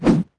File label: swing; library of ruina